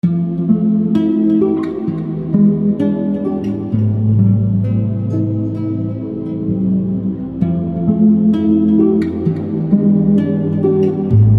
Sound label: guitar challenge